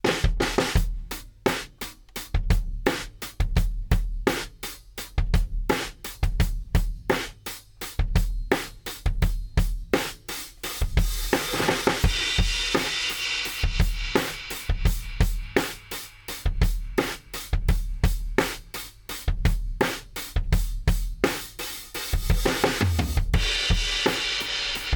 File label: drum room mic ECM8000 FX